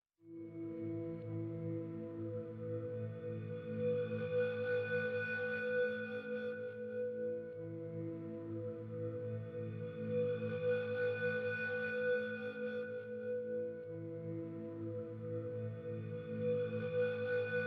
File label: Temple Explore Mood